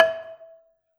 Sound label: Gambang Hard Beater, Gamelan